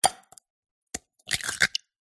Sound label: egg crack into glass jar